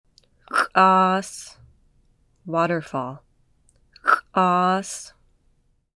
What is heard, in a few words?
Waterfall; co-stewardship